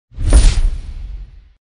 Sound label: chain; FreeKill